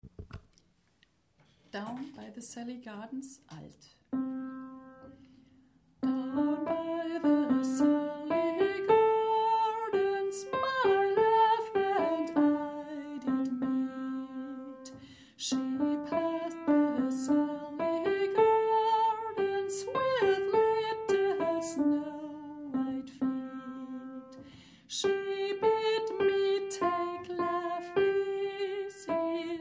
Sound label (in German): Alt, Chor; Down by the Salley gardens; chor ag